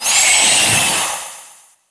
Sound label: octopus hit; DAoC